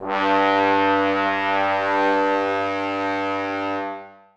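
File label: trombones sustain g2; Sonatina Symphonic Orchestra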